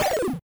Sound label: SFX Armor Minus